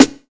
puffy snare drum sample c sharp key, steel snare drum